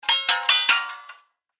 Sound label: Rhythmic Metal Hits; Miscellaneous Impact Sound Effects